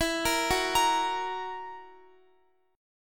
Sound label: E Suspended 2nd Flat 5th E down Guitar; Esus2b5; arpeggiated